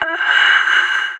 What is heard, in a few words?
death, vo separatist; Counter Strike; CS:GO